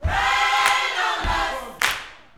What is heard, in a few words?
YOUTH CH PHRASE, Vocal Planet Gospel